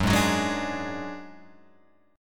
F sharp Suspended 2nd Sharp 5th, Guitar, Open F; strummed; JGuitar

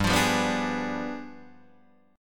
G 7th Flat 9th, Guitar, Open D

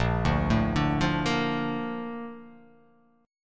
Bb Major 7th, Bb, down; BbM7 arpeggiated; JGuitar

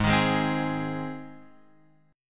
Ab Major Ab, Guitar Open F; strummed; x,3,3,3,3,3; JGuitar

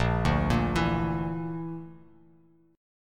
Bb Major Flat 5th Bb down Bass Standard, chord; arpeggiated; JGuitar

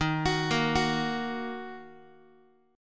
D sharp Minor, Guitar; arpeggiated; JGuitar

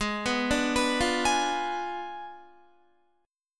G sharp Diminished 7th, Guitar; arpeggiated; 15,14,14,16,17,16; 4F,4A,5C,5G,5C,6E

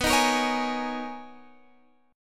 B Major 13th B down Guitar Standard; BM13; strummed; JGuitar

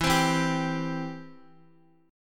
E Minor Sharp 5th E down Guitar; Em#5 strummed; JGuitar

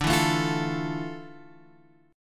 F Major 7th, D; Fmaj7/D strummed

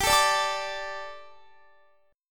G Suspended 2nd G, down, Guitar; Gsus2; strummed

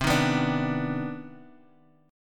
C Minor Major 9th C Guitar Drop D

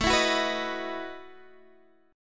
A 6th Suspended 2nd Sharp 11th, guitar

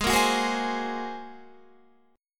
Bb 7th Suspended 2nd Sharp 5th Ab

